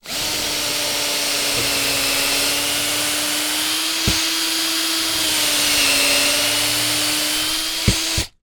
industrial drill cordless drilling screw into wooden sleeper; zapsplat